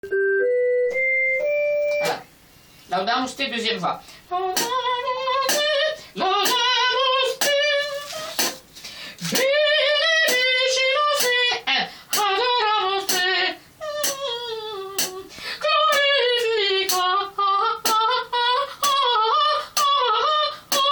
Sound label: laudamus te alto